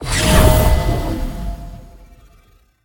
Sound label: channel intro; smite; tiamat